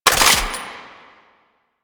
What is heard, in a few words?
Sniper Reload; DE; Warframe; Frumentarius; Exalted Sniper